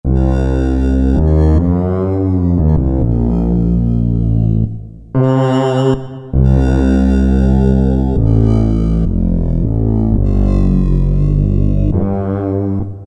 Glide Bass; Roland PN-D50-04

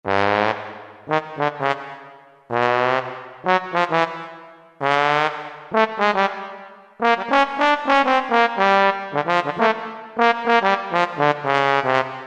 Trombone

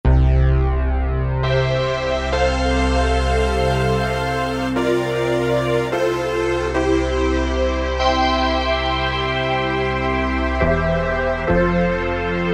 key Synthetic, E-mu PK-6

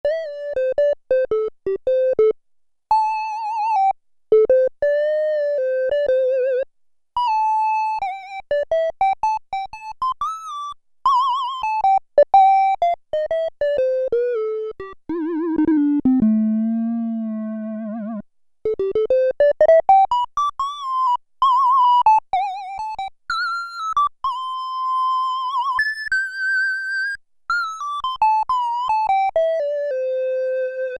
PERCUSSIVE SOLO, Oberheim OB-8; Factory Patches

